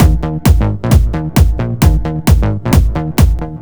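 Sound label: Techno Groove Bass drum Bass, 132bpm; Zero-G; Soundclash; Combi Loops; Nu Dance Electro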